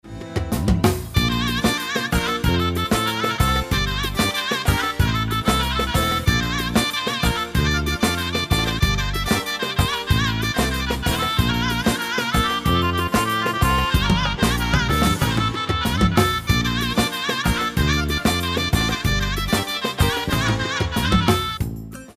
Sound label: zourna; triton extreme